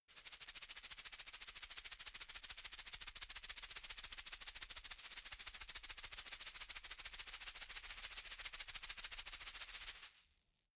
shaker plastic egg rattle hard

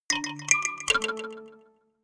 notification kalimba delay triad up major

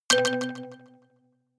notification kalimba delay octave low

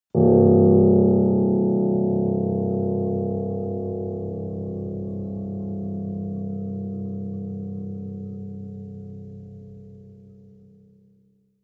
piano deep

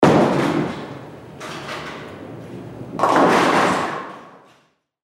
bowling ball all pins

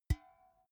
big can empty hit soft

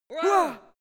two scared men

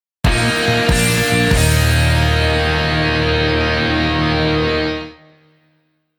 short rock

